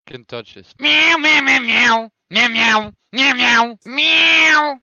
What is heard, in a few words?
skullz meow; torchlight